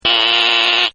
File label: BUZZER, Alarm; Alarms; Sound Effects:Electronics